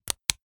household torch flashlight maglite switch on or off; zapsplat